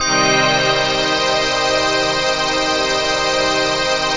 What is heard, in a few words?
PAD, Dance Mega Synth